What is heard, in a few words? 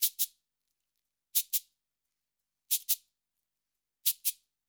089 FREES, Modern Percussion Loops; USB Soundscan vol.56; AKAI